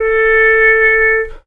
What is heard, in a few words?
whiplash, Horns; Notes